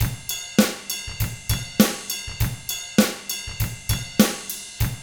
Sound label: ROCK, Drums; ILIO; Double Platinum Drums 1